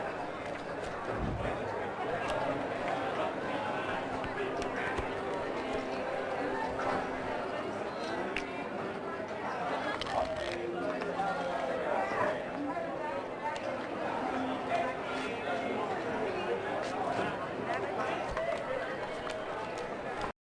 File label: ambience loop; house of cards